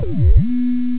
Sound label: space; xfce